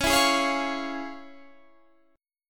F Minor Major 7th Sharp 5th, Db

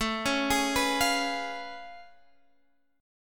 A Augmented Major 9th A down Guitar DADGAD

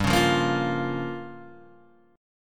G Minor 7th Flat 5th, Guitar, Open G; chordshape; down

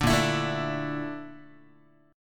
Bb Major 7th Flat 5th Bb Guitar; BbM7b5 strummed; JGuitar

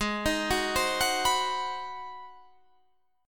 G sharp 7th Sharp 9th, Guitar, Half Step Down

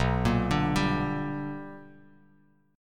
B Minor Major 7th Sharp 5th Bass Standard; arpeggiated; JGuitar

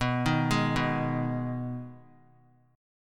B 6th B down 3C 3F 3A 3D, chord; arpeggiated

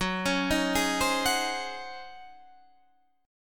Db Major 7th Suspended 2nd Suspended 4th Gb

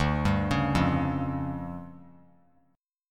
D Minor Major 7th Double Flat 5th, D; DmM7bb5; arpeggiated; JGuitar

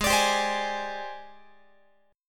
G sharp Major 7th Flat 5th G sharp, down, Guitar; strummed; x,16,17,17,16,19; 4A,4E,5A,5D,5G#,6C#; G#M7b5

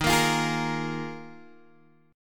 Eb Suspended 2nd Suspended 4th, Guitar, Open G

